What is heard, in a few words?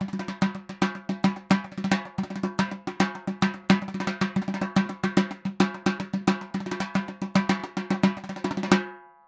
dhol loop, 110